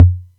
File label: Atlantex Music Percussion Computer Kick, drum machine